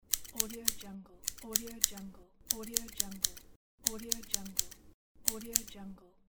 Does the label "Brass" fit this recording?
No